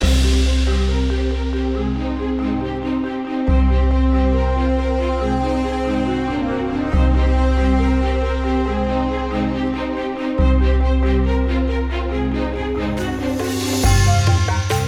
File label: Explore Perc